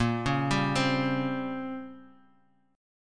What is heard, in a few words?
A sharp Minor 6th Add 9th, Bass Standard; arpeggiated; 18,16,17,17